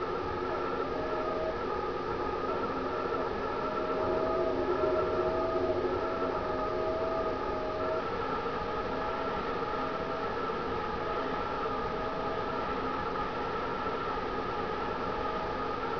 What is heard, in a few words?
wind